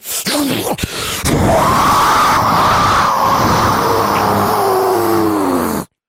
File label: noise; khezu